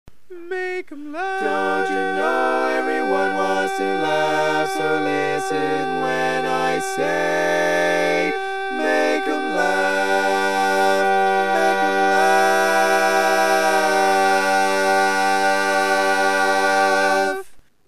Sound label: Tenor, barbershop tag